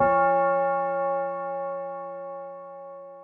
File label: Bell F4; osu